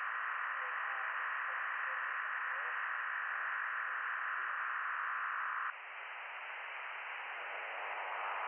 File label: from radio, 7177KHz